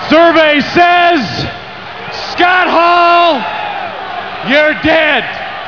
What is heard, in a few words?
sting